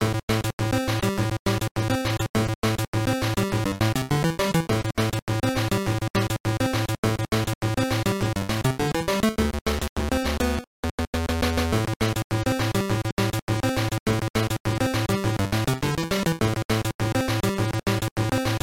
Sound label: Stage; Battletoads & Double Dragon